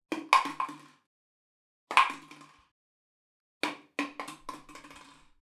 Dropped Plastic Bottle

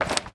Crouch

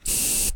Spray Paint

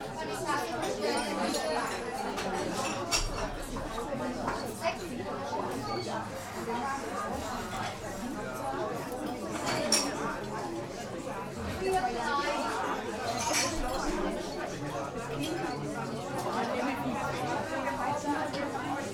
German Coffee Shop Atmosphere

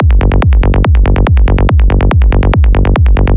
Kick Bass Bassline Loop G1 142bpm Psytrance; Psytrance Vol3

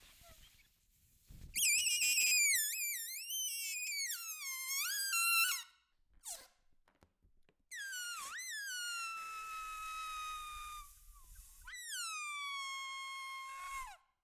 Deflating Balloon

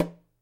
Watering Can Plastic Hit

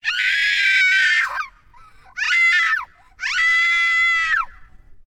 Little Woman Screaming

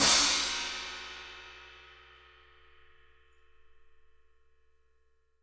China Ff

